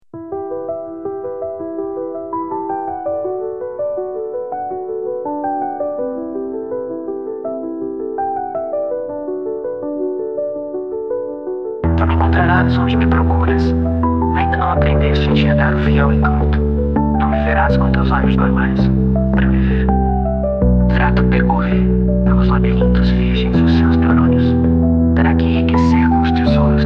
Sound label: piano